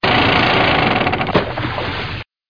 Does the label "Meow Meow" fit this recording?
No